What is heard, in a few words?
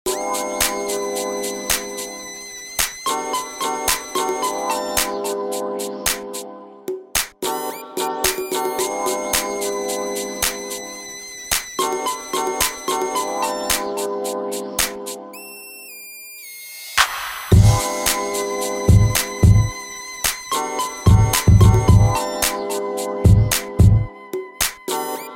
unmastered rap music; hip hop